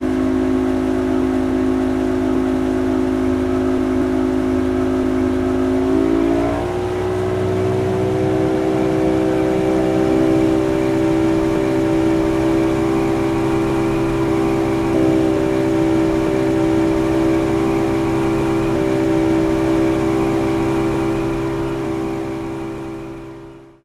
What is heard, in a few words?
motorboat accel